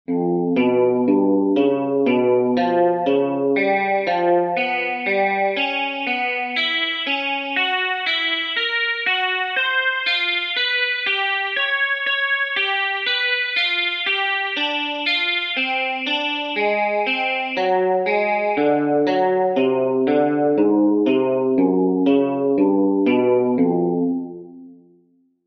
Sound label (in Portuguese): Exercícios Guitarra 60 bpm